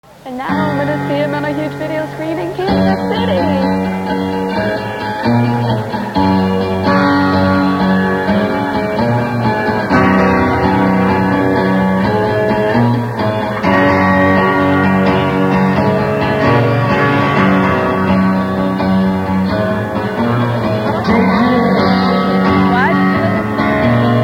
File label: Acoustic Sung Live